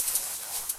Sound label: crop wheat